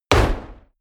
fire burst dry hard; whooshes rises; hits impacts

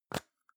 plastic can lid screw open hard; objekte; dose

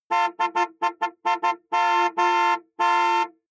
car horn chopped exterior hummer h3

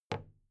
big plastic case handle bounce soft

